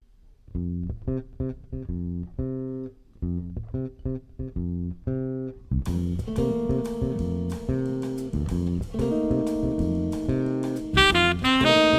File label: quartet, latin ensemble